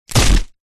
impacts body person heavy

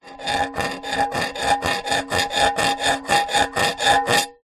foley tin small metal empty knife rub saw against; zapsplat

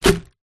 apples packet down on wood surface; food

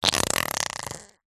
human fart wet weak

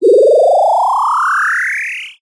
cartoon rise grow expand

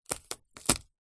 garlic clove crush with knife blade; food

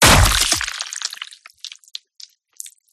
DESIGNED CINEMATIC CHOPS; PMSFX

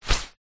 raincoat sleeve arm movement walking single; foley

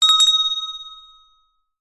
bell small hand ring short

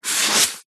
office sponge brush single stroke on paper; zapsplat

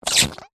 cartoon squash deflate; zapsplat

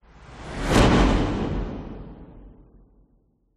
whoosh; orlando lanzini

